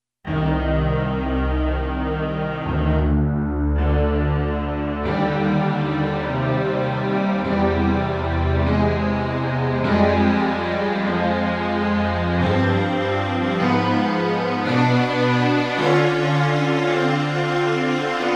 Epic Strings Movie

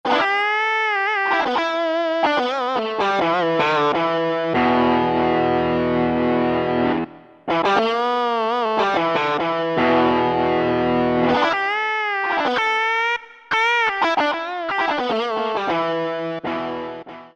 Overdrive Gt, Roland SC-880; Factory Tones

